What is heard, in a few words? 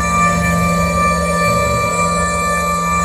chain of attacks loop; LoL; xinzhao